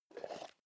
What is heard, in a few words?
plastic bowl small open soft